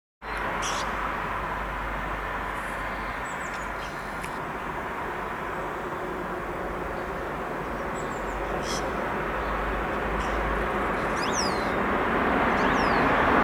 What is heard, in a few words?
starlings